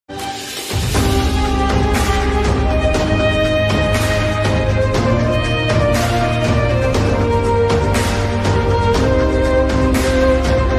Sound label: Tamil ringtone